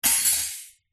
impact metal object small light drop into small metal basket